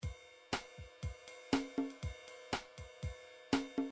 drums cha cha

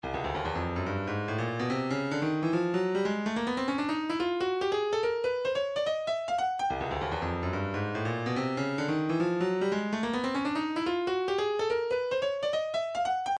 144 wavedrum

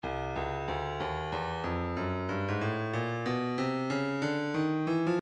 breakbeat 093 bpm; Stylus; Hipster

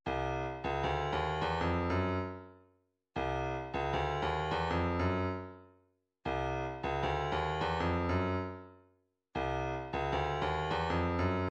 155 snare

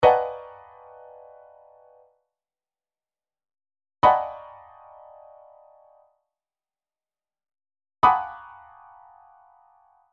chord lines B, midi; Midi Files 1000

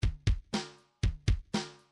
bdf midi file grooves rock b rock b

